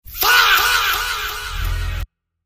faaaa

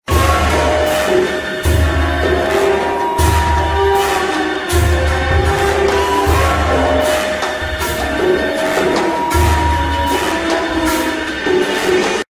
chase music; hello neighbor

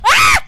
jump scare; kita; Viral Soundboard

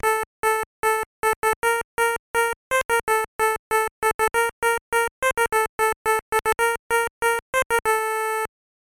spanish dance; rbi baseball 3

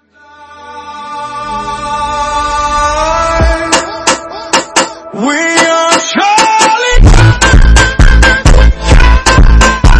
funk slowed reverb; TikTok Soundboard

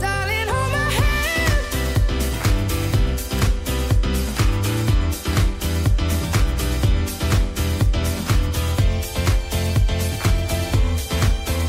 instrumental; jet2holiday; TikTok Soundboard

